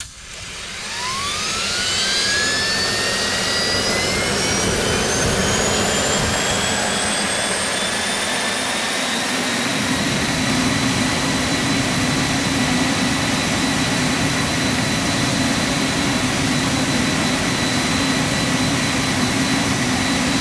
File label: Aircrafts ASU; DCS